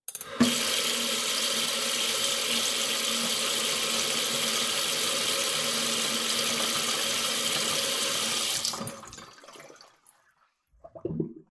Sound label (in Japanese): 蛇口から水が出る音